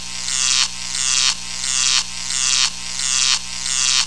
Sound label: electric